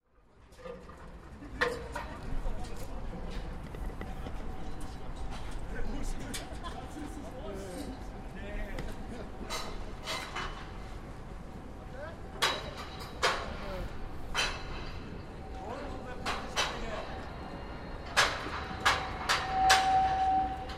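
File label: construction sounds; neuer markt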